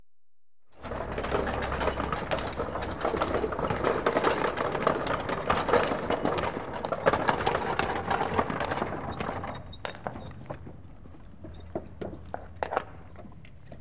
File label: One Horse And Cart At Walk Approa, Horses; Horses & Dogs; BBC Sound Effects Library